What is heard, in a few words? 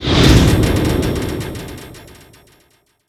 fadein